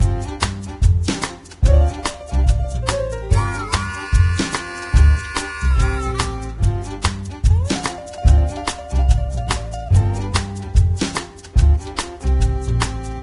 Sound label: Train Music; Diner Dash: Flo on the Go; NDS